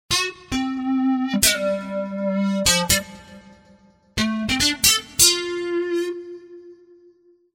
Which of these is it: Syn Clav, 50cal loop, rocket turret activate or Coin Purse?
Syn Clav